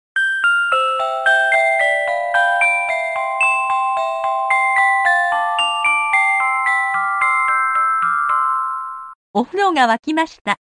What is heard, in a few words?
ringtone